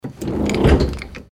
door

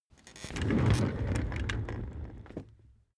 office chair loaded trundle

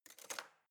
knife wood drawer place soft

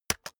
keyboard external space hit fast

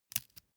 match take

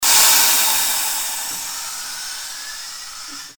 steam pressure

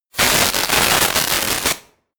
sparks synth dense

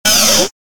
servo agressive

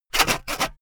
sawing hard unsteady short

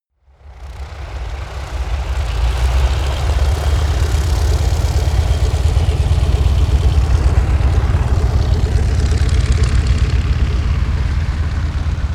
propeller plane ju52 maneuver short

